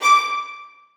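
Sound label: 1st violins staccato c#6; Sonatina Symphonic Orchestra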